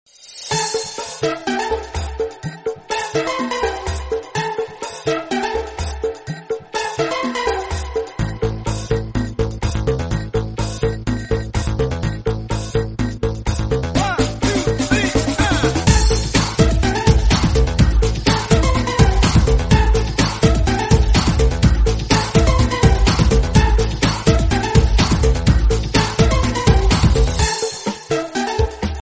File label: nokia remix, ringtone